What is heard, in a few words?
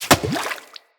Bow Arrow Impact Water; Weapon Impacts; Player; Bows; Environment; Hunter; Envoy Hunter Bow